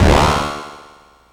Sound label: cannon; daphne